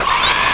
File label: drill